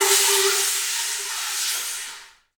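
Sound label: wind bull, Africa, Afro horns, bullhorn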